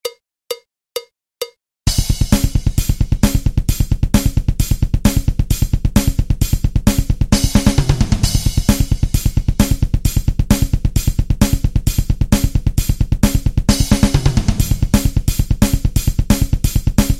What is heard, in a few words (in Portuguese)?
Guitarra Escala Tremolo 132